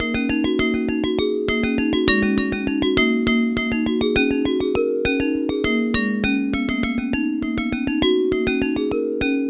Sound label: Fast Glass